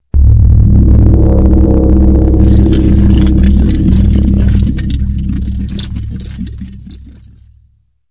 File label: Stinger; STINGERS